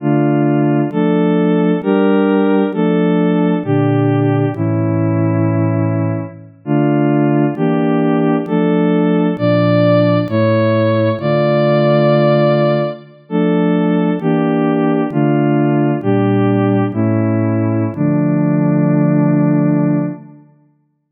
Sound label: chorale, hurdy gurdy; counterpoint